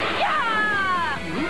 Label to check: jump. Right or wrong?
Right